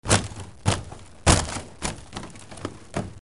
BOXMASH

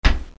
THUNK

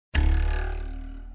ruler twang

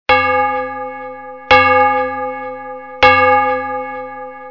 BELLS

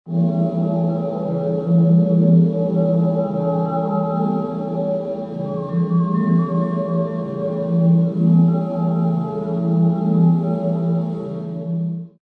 ondes martenot

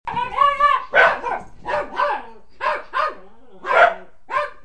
angry dog

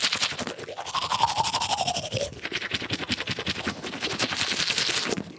Cleaning Teeth

